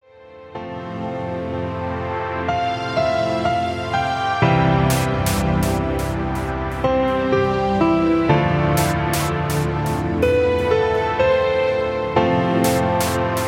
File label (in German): musik hoerprobe